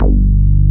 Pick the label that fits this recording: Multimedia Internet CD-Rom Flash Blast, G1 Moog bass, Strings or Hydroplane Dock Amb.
G1 Moog bass